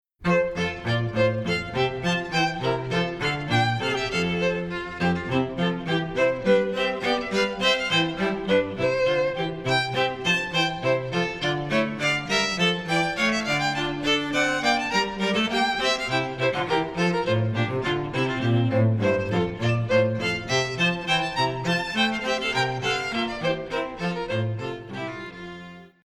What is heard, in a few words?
trio bouree